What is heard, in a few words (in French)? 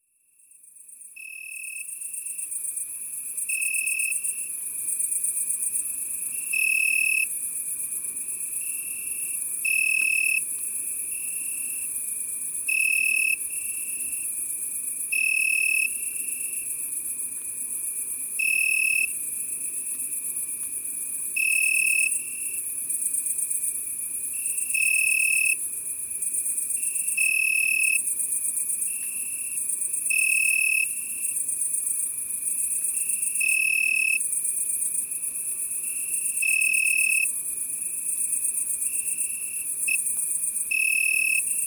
Grillons d Italie et grandes sauterelles vertes le soir; Les Vans; Ambiances Sonores d Ardeche